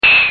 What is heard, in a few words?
small shot